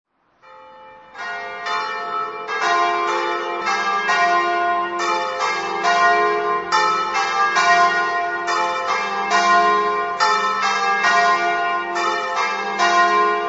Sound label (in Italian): campane